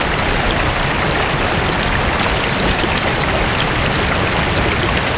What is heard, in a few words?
fountains; water; Tony Hawk's Project 8; XBOX